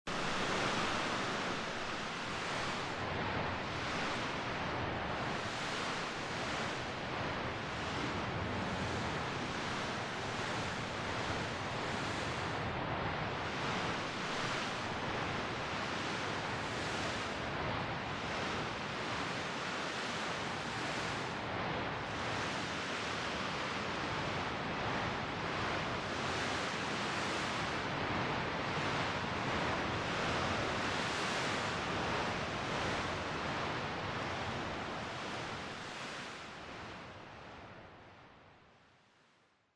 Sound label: processed rain